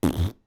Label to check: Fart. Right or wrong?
Right